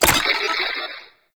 Play Next Video; Warframe; NineteenNinetyNine; Stage6TheCatCameBack

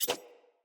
Dagger Whoosh; Sinecure Dagger

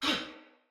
Stun, Male, en; Battle; Barks; Dialog; Sinecure Banneret

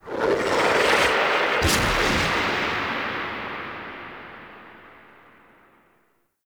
Bow; Leverian; Ivara; Artemis Bow; Warframe Powers

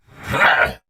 Attack Effort

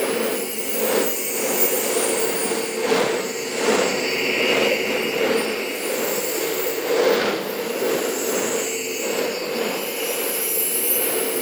glyph ball loop; Warframe; Duviri; Glyph Puzzle

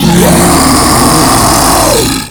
Infested Ancient Taunt Scream; Warframe

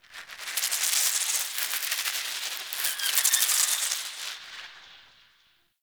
Cave Squelch Bat; Warframe; Tau

